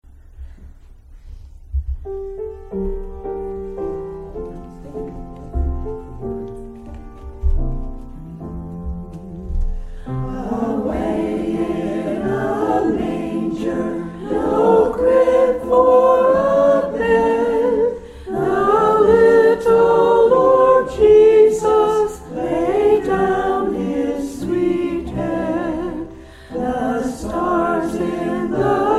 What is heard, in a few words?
Choir; Away in a Manger